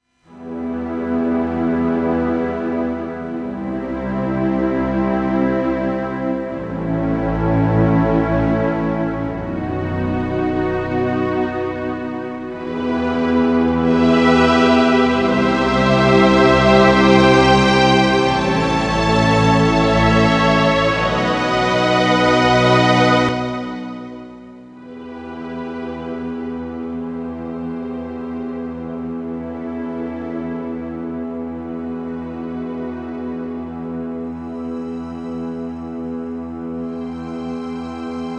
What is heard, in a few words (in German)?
Streicher